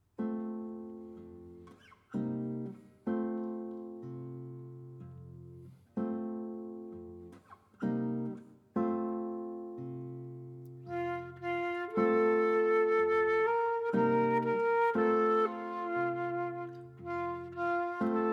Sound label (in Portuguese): Melodia Rustica IV; PureSound